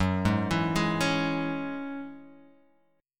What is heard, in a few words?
Gb 7th Suspended 2nd Gb Guitar Open G